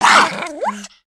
dog injured

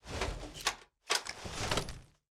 Wpn tesla cannon jam

